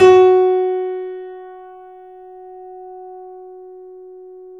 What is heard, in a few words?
F#, 3D Pianos, Boesendorfer